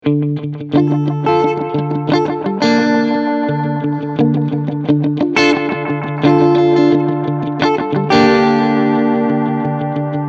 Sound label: Short Delay Mod ON